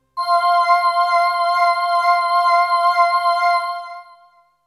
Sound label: Melody oneshot; ZERO G - Datafile DISC 2